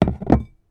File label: Put plate